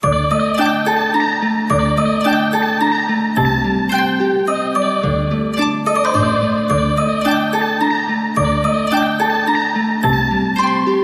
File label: Jingle Rpg Map